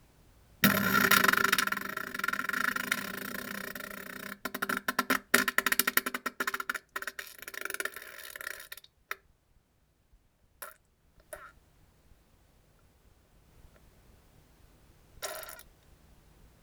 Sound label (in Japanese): おなら, ASMR; ちょっとおまけ なんべんが出そうででなかった時おなら２ 約16秒